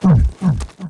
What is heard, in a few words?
WAR DAMAGE